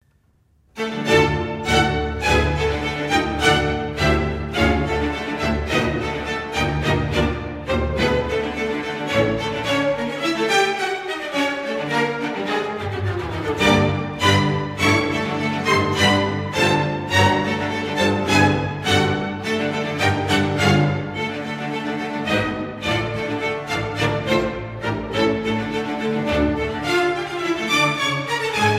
Variations Bourree classique; theme F.Bridge; Benjamin BRITTEN

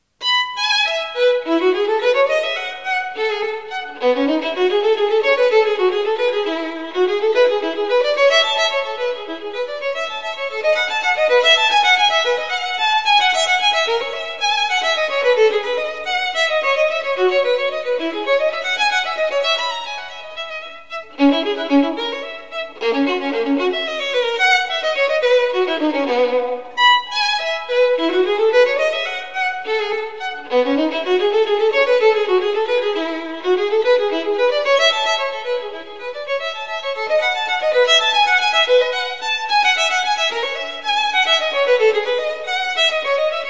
Partita pour violon seul Gigue; BWV1006; Jean Sebastien Bach

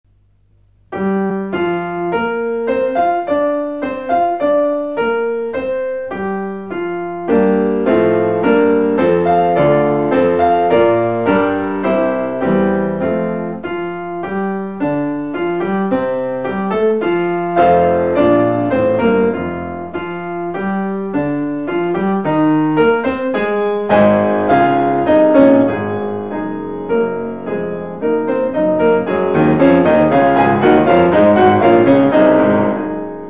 Tableaux d une exposition Promenade 1 piano; Promenade 5; Modest MOUSSORGSKY

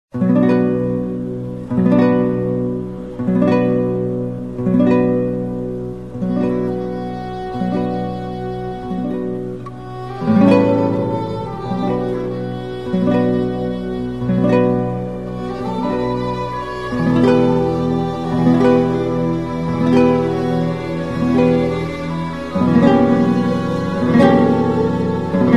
Concerto pour guitare et orchestre Theme; Aranjuez; Rodrigo

